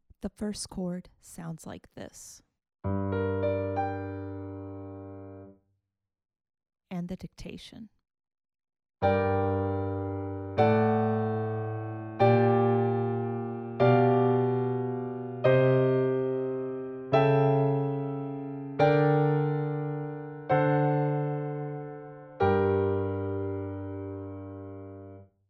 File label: harmonic dictation